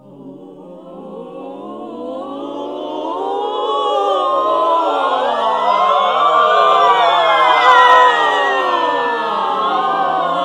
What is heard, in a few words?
NOISE, CLASSIC VOX; Best Service; Hallelujah